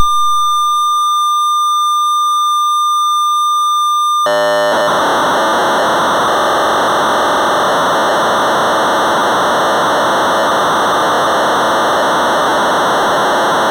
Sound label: ELECTRIC PENCIL, sol-20 software tape wav files; processor technology